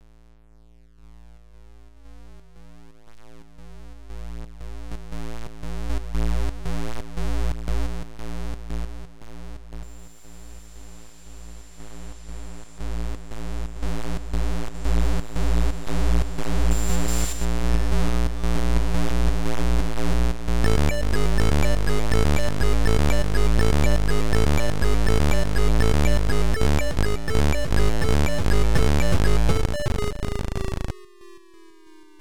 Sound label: Tekkno